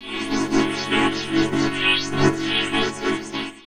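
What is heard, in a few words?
Pad Synth; Equipped Music; Loungin House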